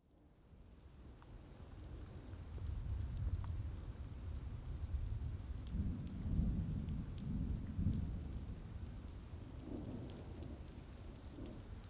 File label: AMBIENCE Weather Soft Rain Thunder; SmartSoundFX